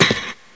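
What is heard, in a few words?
gr step, footstep metal grate; SINDEMO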